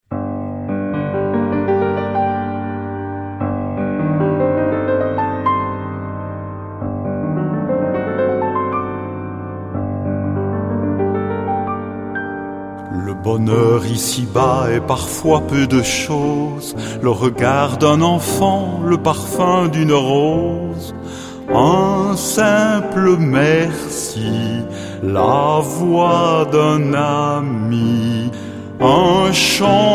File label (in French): chant